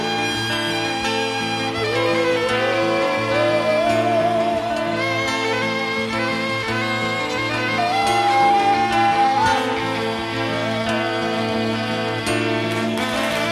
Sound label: Acoustic Guitar